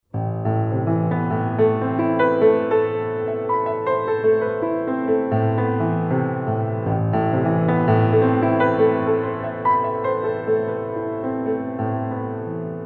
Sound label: Dream Trance